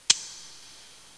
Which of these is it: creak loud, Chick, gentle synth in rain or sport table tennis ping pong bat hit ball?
Chick